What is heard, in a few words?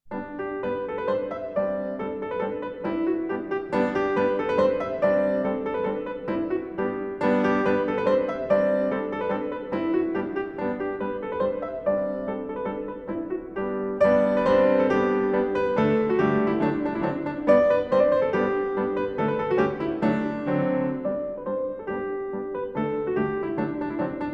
2 Bourrées, g-moll und A-Dur, Piano; Chopin